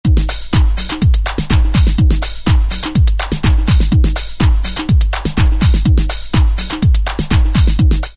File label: 909 Swing 124, Roland SR-JV80-06 Dance